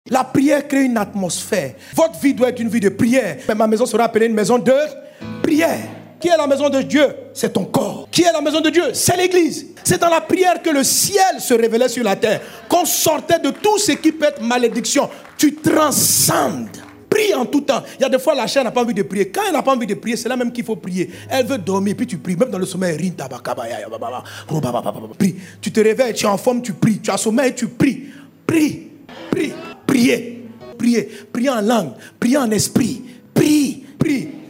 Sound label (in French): REVEIL SON